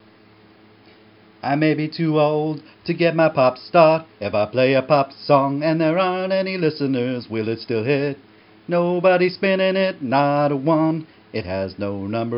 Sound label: Pop Start initial sketchy vocal